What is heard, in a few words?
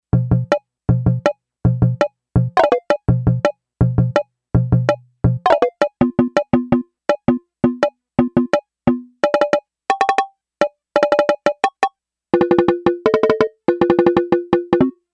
Bo Conga, Yamaha DX11; Factory Preset Voices